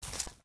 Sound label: FS Weeds, footsteps